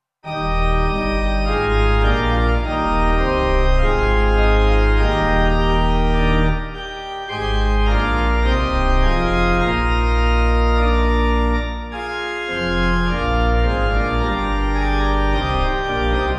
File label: Gloria Pipe; Communion; Dudman